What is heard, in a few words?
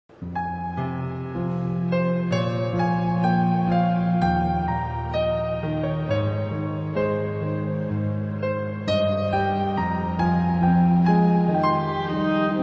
Piano and Violin